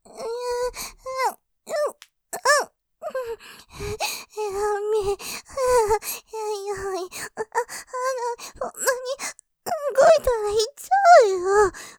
喘ぎ とろとろ感; 動いたらイッちゃうよ；; すぐに感じちゃう; ループで楽しむ！シェフの気まぐれ抜き抜きコース; モノラル

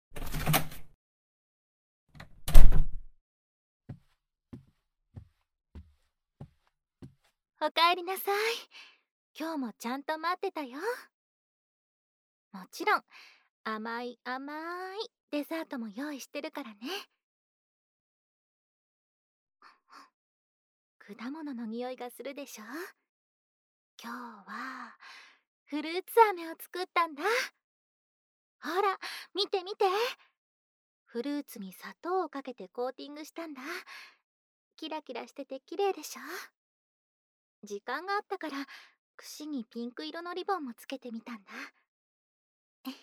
食事音 フルーツ飴, ASMR; 『フルーツ飴のできあがり♪』

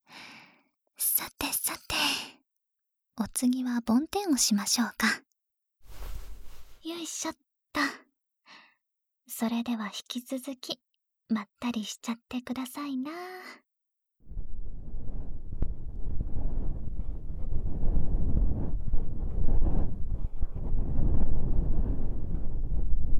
梵天

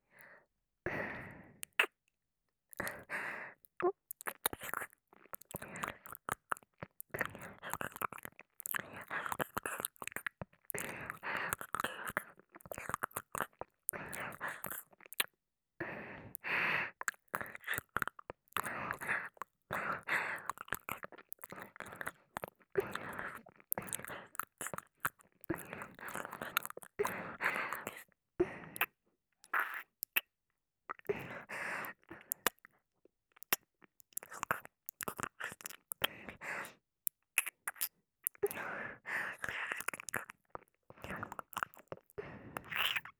耳舐め エンドレス 右へ反転, ASMR; いのりママ

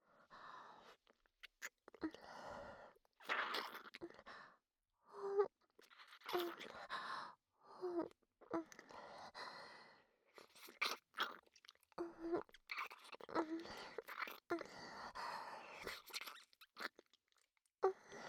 キスのみ, 正面のみ

